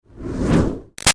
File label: Fire Torch Whoosh Med Up; Flames; Objects; Big Room Sound